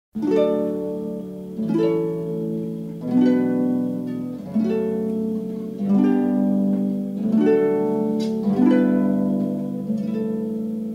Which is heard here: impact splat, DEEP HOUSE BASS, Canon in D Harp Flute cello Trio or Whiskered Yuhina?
Canon in D Harp Flute cello Trio